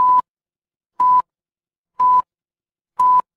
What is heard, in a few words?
ui countdown